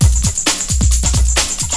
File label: breakbeat; jazz jackrabbit 2